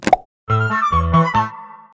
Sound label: golden egg; Angry Birds